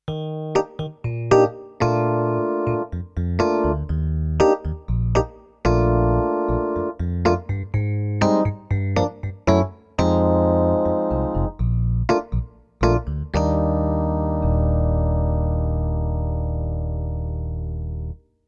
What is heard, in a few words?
E Piano